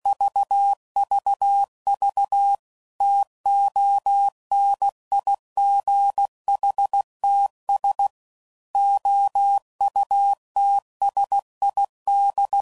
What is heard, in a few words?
CW Code Groups 10 WPM, Weather